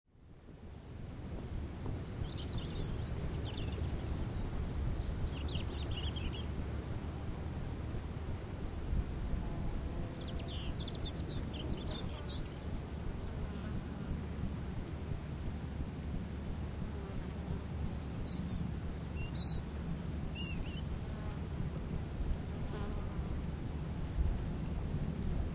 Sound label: Quiet Place By The Sea, Small birds tweet, faint sound of the ocean; occasional insects. Long sound, almost 6 minutes; faint sound of the ocean nearby; Outdoor Sound Effects; LynneMusic